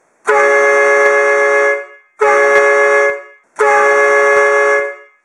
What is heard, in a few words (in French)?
son klaxon triple camion; klaxon mdk 303 triple trompes acier